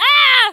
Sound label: Female Pain